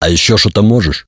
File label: reaction music; STALKER